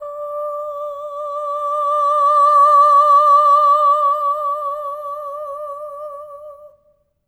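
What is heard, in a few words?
Soprano Oh D5, Voices; Spectrasonics; Symphony of Voices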